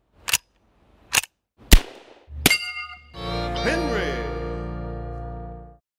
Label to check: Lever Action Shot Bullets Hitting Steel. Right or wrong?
Right